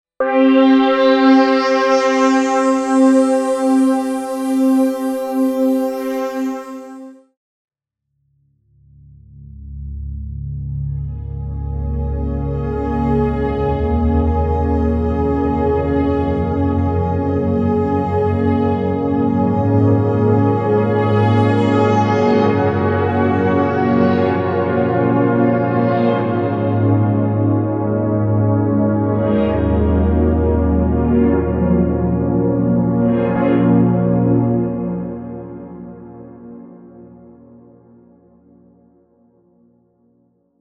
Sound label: 48kHz Org Sample Backwards Reverb; EIII